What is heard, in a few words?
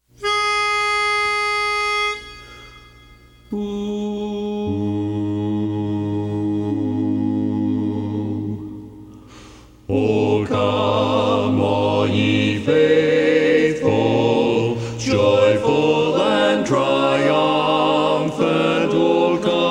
O Come All Ye Faithful bass, barbershop